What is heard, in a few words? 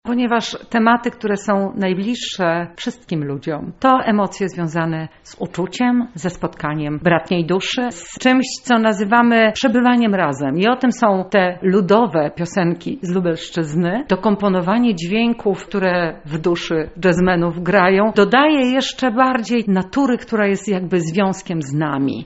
jazz folk